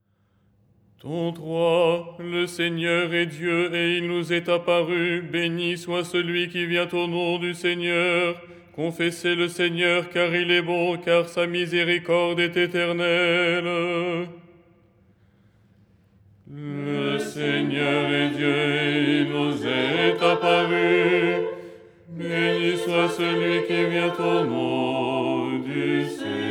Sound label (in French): basse; ton 3